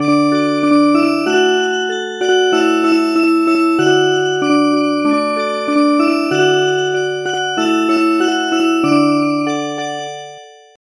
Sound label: Music Box, midi traditional